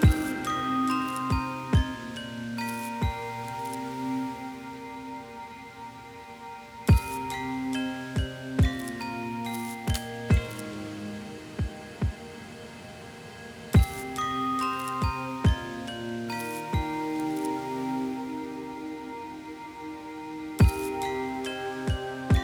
Plaintive Bells; BB 2HTC Samples Vol 4; Solos

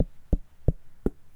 Knock the Melon; Micro Pack

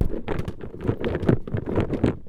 Rolling Bin of Plastic; BB Retail Therapy Sample Pack

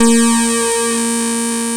static, Retro Sounds 32-Bit

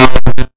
Retro Electric Short

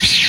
Sci Fi Gun, retro arcade; Guns; MMRetroArcadeSoundsPack

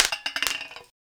Drop Tabla; FREE SFX PACK VOL 3

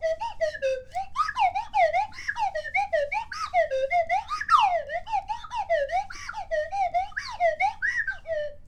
Random Stabs Slow, Slide Whistle

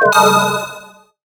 Retro Event

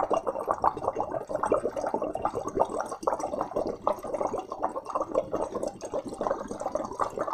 loop bubbles, water splash; water splash slime sfx

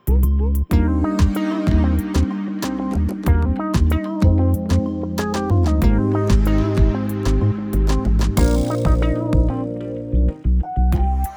Funkums, Solos; BB 2HTC Samples Vol 4

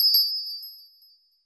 Bell Shake; FREE SFX PACK VOL 2

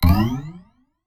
Retro Jump Stereo UP Simple; Bounce Jump

